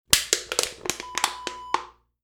aluminum can crush

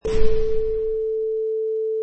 Garage Door Slam

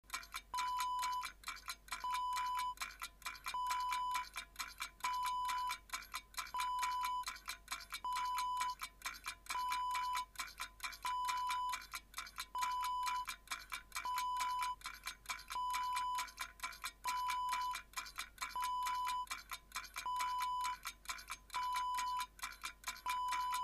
clock ticking

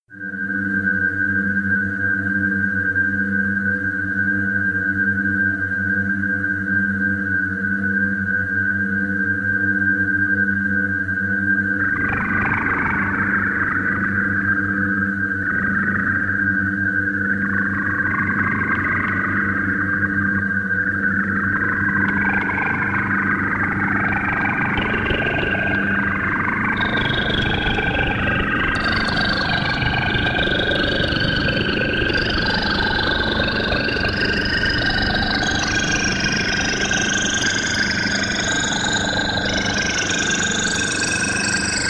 Extra Long SFX

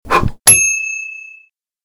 karate kick

